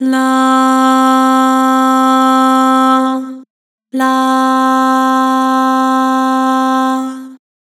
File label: "Lah", Vocals; Harmor